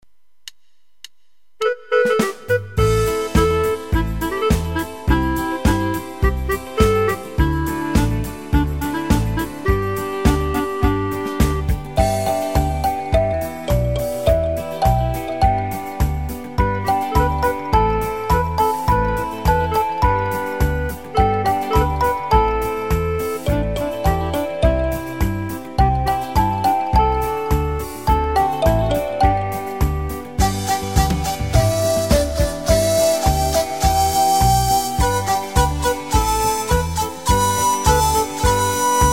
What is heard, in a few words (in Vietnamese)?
Nhạc không lời